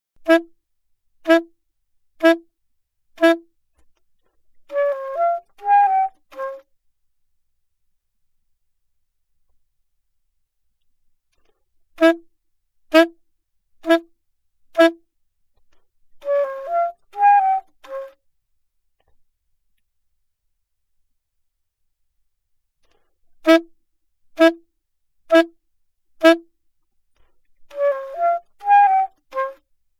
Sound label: altosax